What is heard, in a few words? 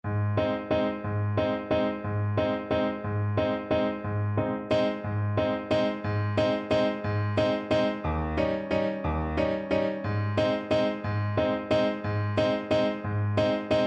horn, French Horn